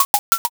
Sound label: reload